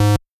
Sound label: bass